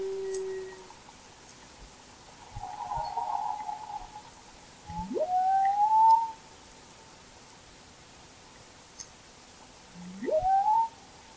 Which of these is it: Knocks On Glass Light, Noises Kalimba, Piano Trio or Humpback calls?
Humpback calls